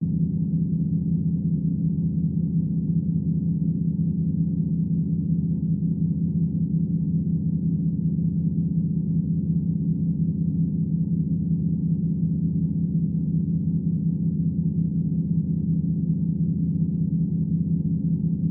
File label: alien ship hum loop ambience synth electronic steady tone